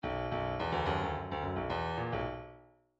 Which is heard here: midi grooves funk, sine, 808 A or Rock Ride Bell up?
midi grooves funk